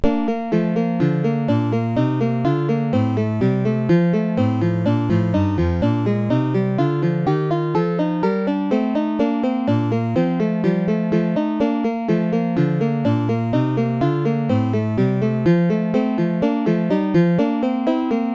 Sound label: menuet; OctaMED MED